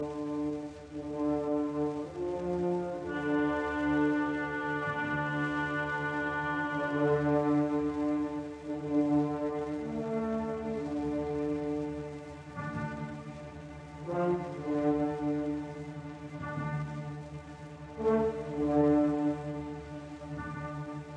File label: Symphony in D minor Feierlich misterioso; Bruckner; Bruno Walter